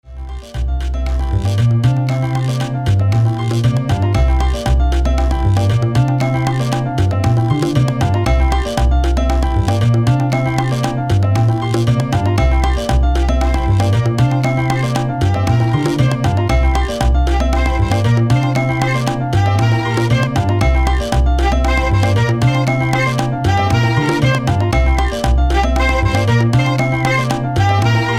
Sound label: jingle